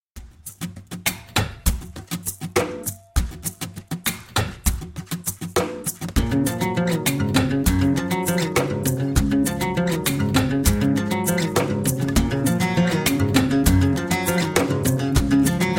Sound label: ode to joy instrumental